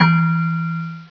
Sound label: Celesta E3; Star Trek JR Audio